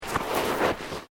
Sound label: Footsteps Boots Sand Deep Step; Footstep Foley; Human